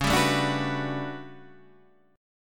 A 13th C sharp, guitar chord

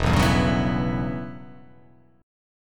E Minor 6th Add 9th chord

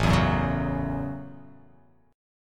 A 6th, guitar; 5,7,4,6,6,x,x,x

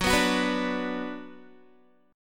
Gb Suspended 4th Sharp 5th, Guitar; Gbsus4#5 strummed; 14,14,16,16,14,x; 4E,4A,5D,5G,5C,6F

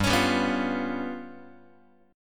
G 7th Flat 5th G down Guitar; strummed; JGuitar

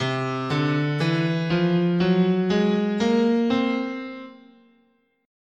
C Major Locrian, scale

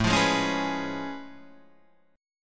A Augmented Major 9th A down

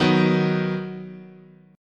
Eb Minor Sharp 5th, chord

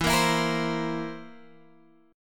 A sharp Suspended 2nd Flat 5th E

